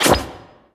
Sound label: hole open; littlehorn